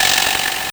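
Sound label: Game Boy Perc, DRUMS; ChipShop Deluxe; ChipShop Hits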